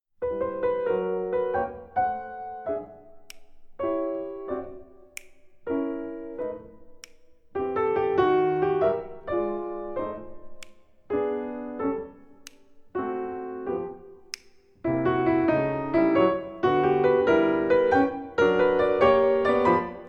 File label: rags e minor unrushed